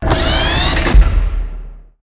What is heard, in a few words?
sqeel